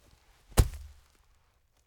Footstomp Mulch

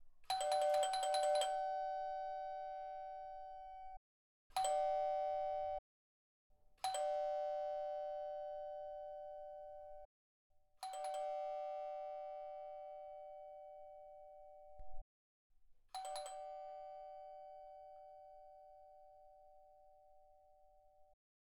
Doorbell

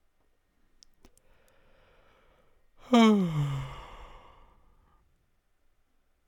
Yawning Sound By A Man

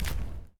Step In Gravel

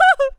Vocal Joy And Excitement

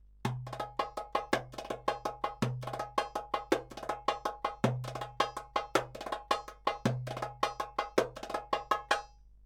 Darbuka; Yuruk semai

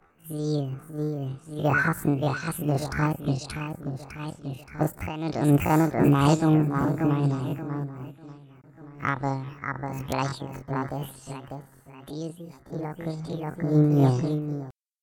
Witch

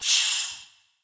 Pressure Hiss

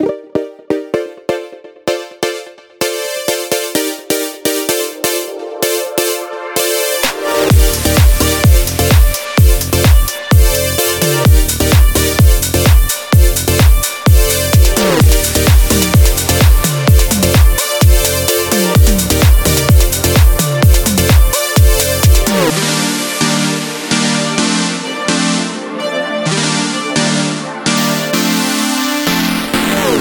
Background Music 128bpm

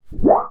Metal Bending

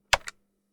Phone Button

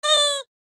Squeeeeeeeeeeeeeeee

